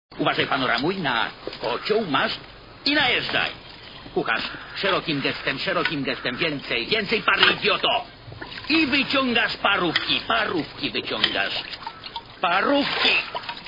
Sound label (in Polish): parowy